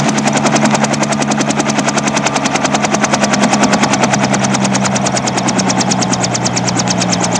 HELICPT; trasporti; elicotteri

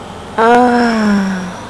"OHHHH"; gemiti esclamazioni mugolii

